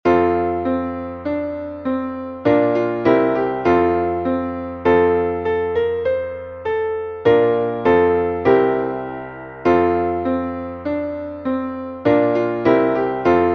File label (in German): klavier morgen kinder wirds was geben